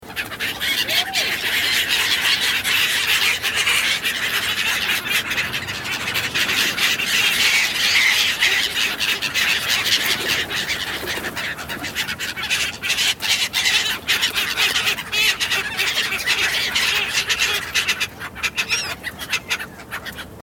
Moewen und Tauben

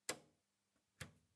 Schalten

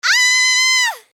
schrei weiblich

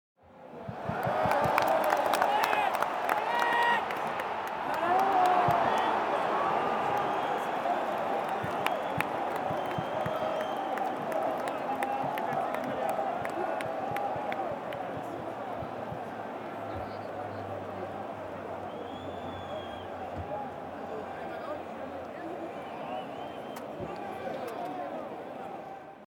Stadion Atmosphaere

